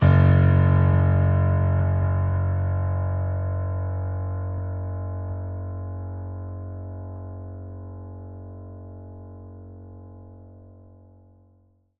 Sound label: F sharp 3